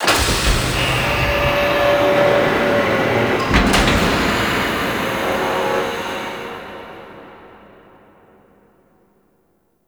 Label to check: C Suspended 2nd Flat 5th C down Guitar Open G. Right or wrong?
Wrong